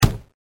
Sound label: THUD BOX